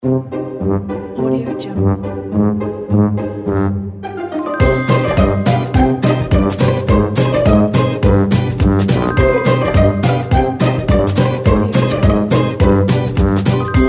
funny music

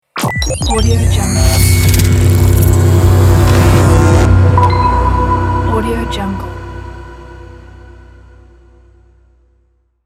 technology logo

